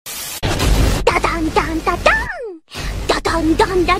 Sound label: theme song anime style; battlefield 3